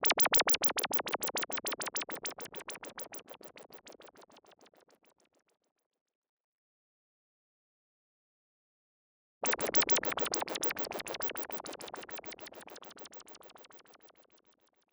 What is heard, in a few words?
Freeze MS-20